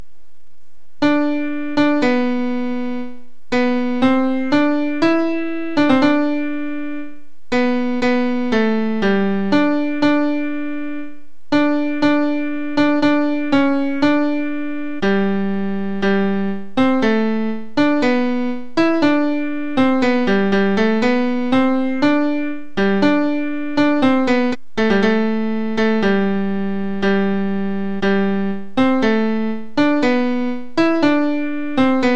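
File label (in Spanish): Tenores, MIDI; Come Again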